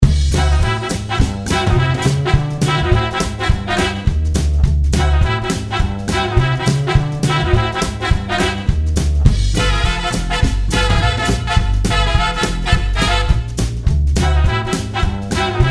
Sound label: Jazz Runaround